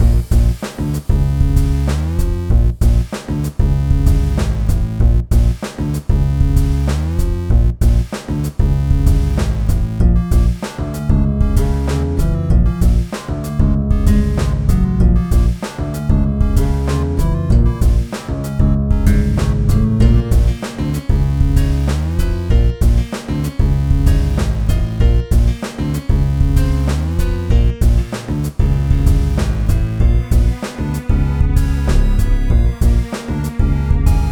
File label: More Bass (loop)